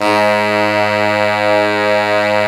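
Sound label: low brass, fat pop section; Roland L-CD702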